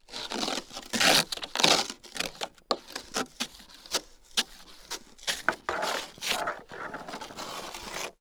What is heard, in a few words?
window ice scraper